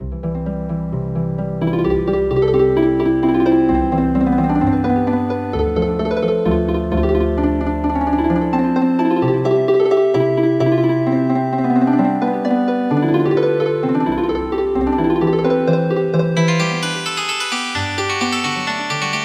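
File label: Healing Harp; Tatschli Schottish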